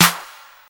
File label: fresh clap sound f key, Royality free hand clap sound tuned to the F note. Loudest frequency: 4430Hz; single hits one shots